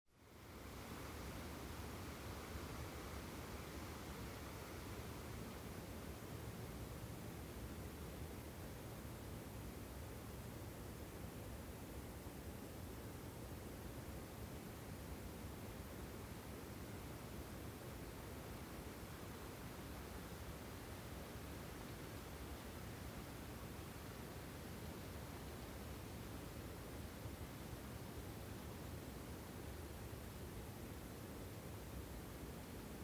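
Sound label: quiet leaves; Звук ветра в лесу, звук ветра в листве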